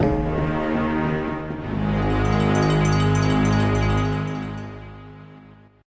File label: Old mining jingle